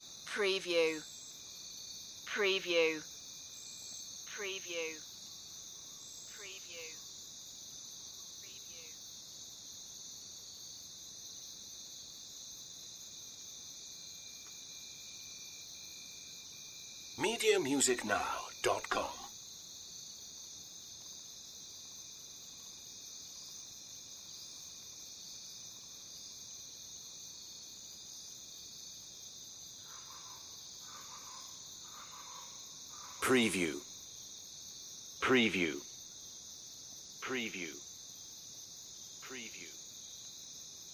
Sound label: jungle crickets